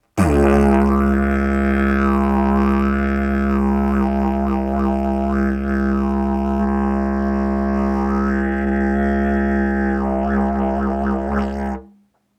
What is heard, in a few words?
Didgeridoo, ringtones; Google Pixel 4; Reality Bytes